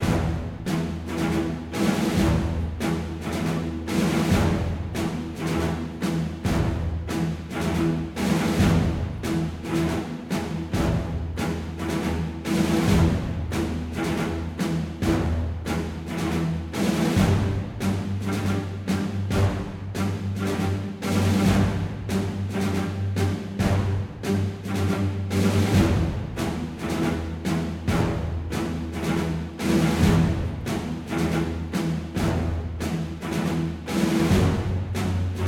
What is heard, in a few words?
March Of War (Sub-Menu) Loop; Attrition: Tactical Fronts